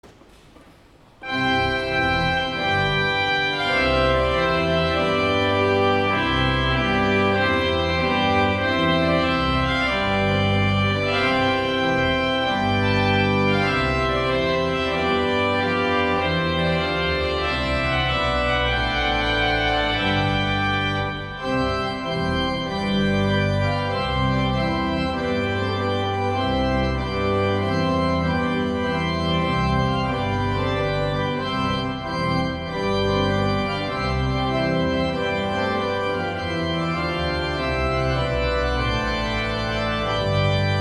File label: Organ, Trumpet Tune in C Major; St. John's Cathedral, Jacksonville Florida; Henry Purcell